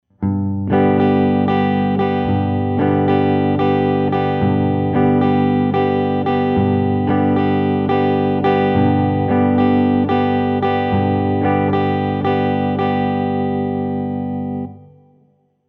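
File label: Basic Right Hand Strum Pattern, guitar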